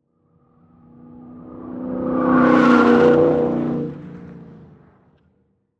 Transportation Car Race Car Drive By Sharp Turn From Ground Distant